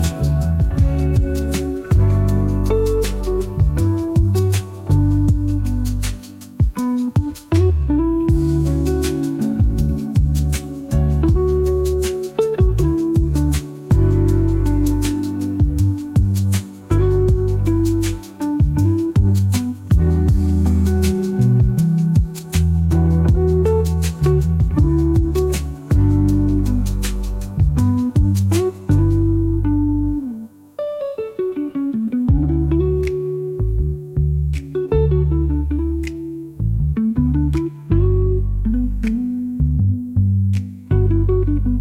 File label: Chill Lo Fi; Late Night Coding